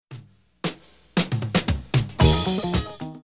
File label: Drum fill